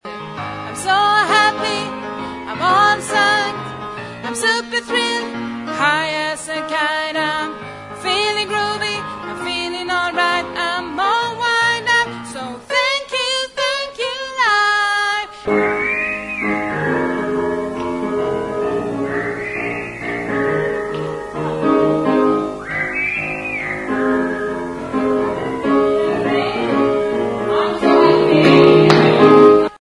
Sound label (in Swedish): bas